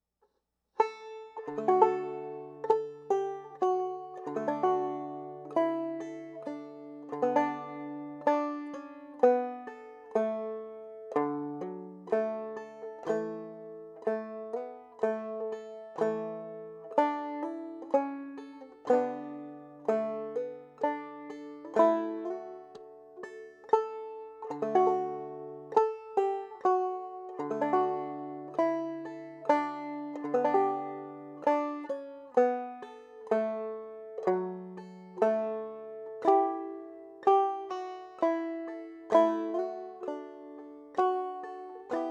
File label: slow; Christmas